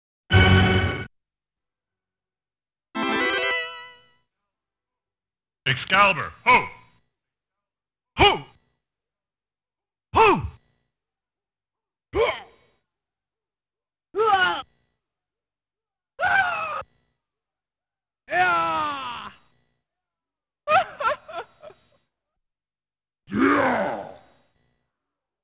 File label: S.E. & VOICE COLLECTION